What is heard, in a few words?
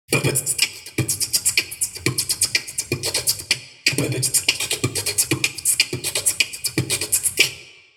beatbox jungle 16 beats